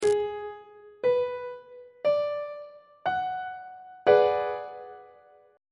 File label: b minor 6th 3rd inversion on treble clef